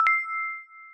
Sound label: Bell